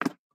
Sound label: Peg Leg Footstep